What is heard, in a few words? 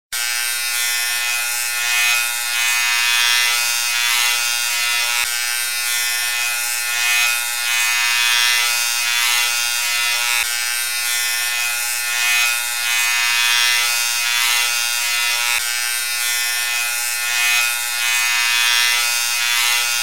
Shaving